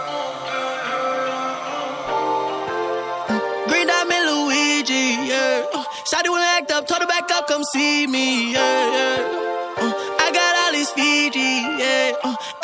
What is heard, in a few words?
US English Ringtone